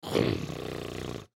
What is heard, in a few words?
Human Snore, Snore Element Male 25 Yrs; Snoring; Blastwave